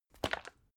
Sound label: bottle syrup upend; berufe; gastronomie